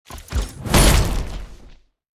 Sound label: BlizzardBash